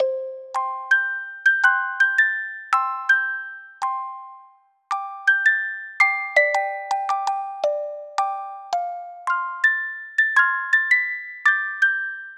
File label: Reggae (Music Box)